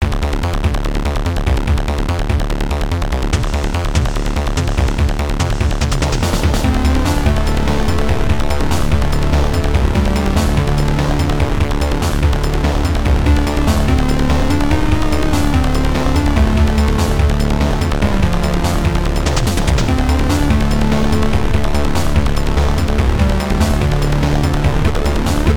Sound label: Sledge Hammer; SonicArr